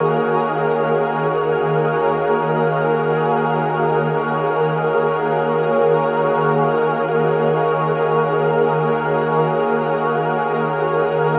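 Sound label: pad loop patient 150 D# minor; Virtual Riot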